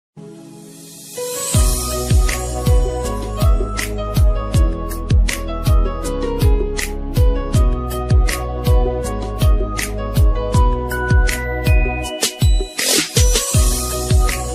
Mobile Ringtone Instrumental Ringtone Popular Ringtone Romantic Ringtone